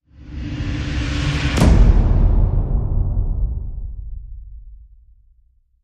Hit Suck Hit

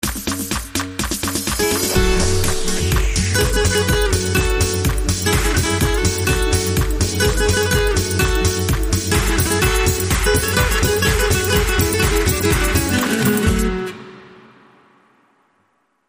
Flamenco Fusion

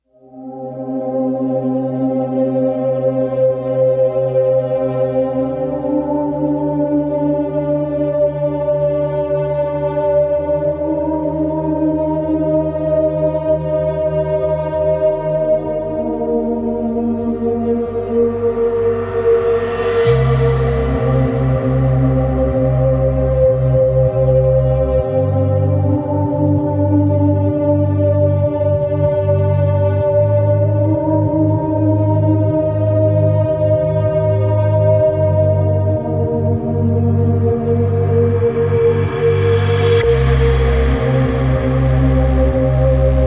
Underscore

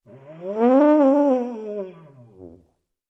Tuba Elephant Call

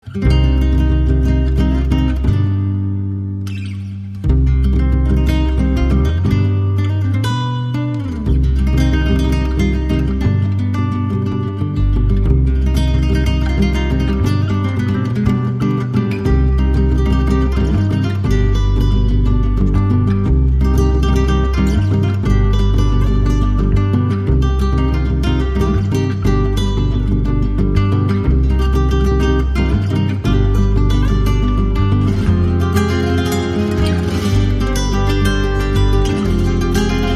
No Percussion

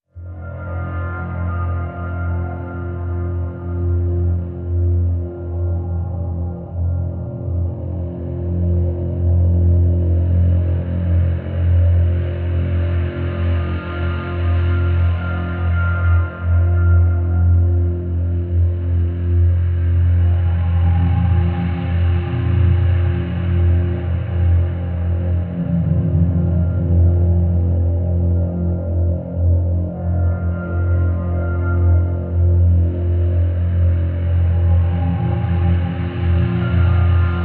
Drn Beta F

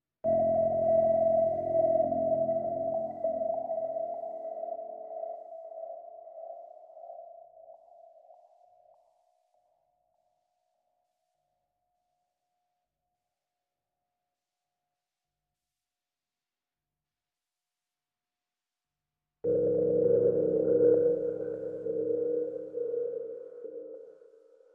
Red Tape, Noises

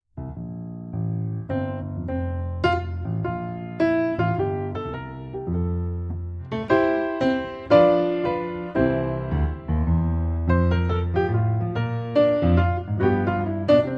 The Twelve Days of Christmas Upbeat; Trad (PD)

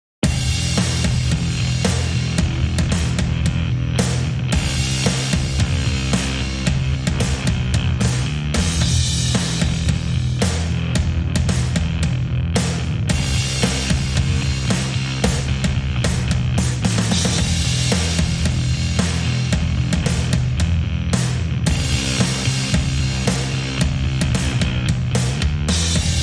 Drums and Bass

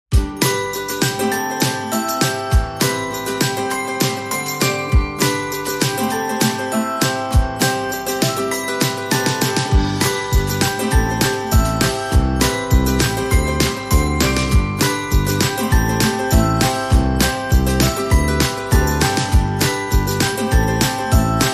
Festive Shuffle, No Voices

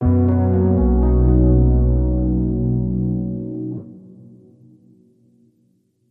Emota Sting

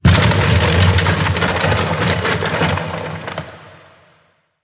Meat Grinder

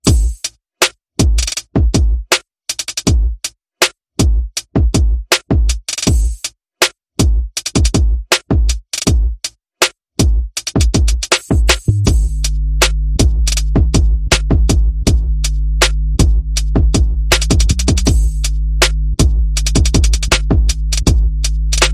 Bass and Perc

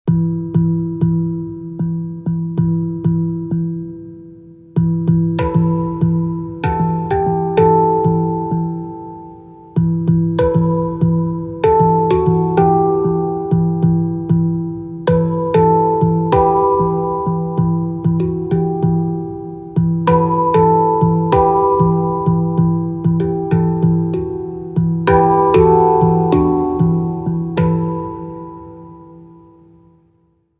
Solo Handpan

